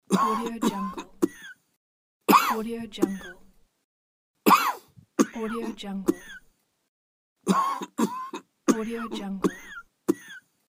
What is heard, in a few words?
cough